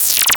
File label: ZAP